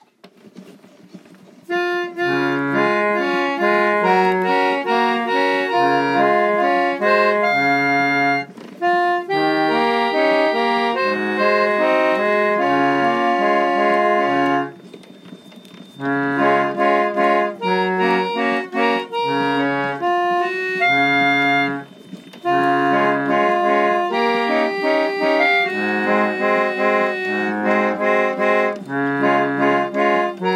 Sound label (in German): mandolina; drehorgeltag 2018